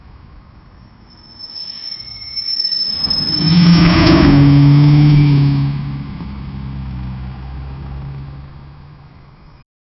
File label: sound of car scritching, cars